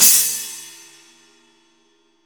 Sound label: CHINA, Real Mega Drums, AMB KIT; Best Service; Real Mega Drums VOL-1; GM